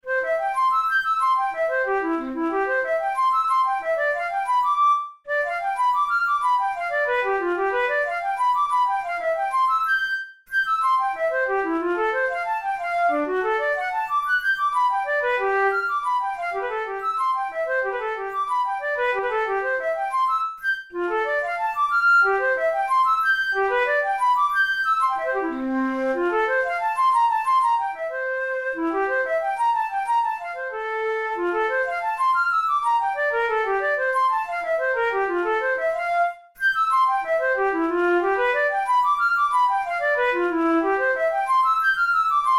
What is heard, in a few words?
studies for the boehm flute; drouet 72